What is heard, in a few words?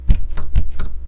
locked